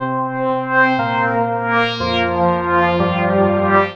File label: Marian String; Strings & Pads; Sonic Foundry (Sony Creative Software); The Electronic Music Manuscript Collection